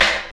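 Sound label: SD, snare drum; X-Static Goldmine 1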